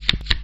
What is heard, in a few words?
deal two cards; PokerTH